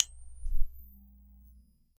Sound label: a tube light flickering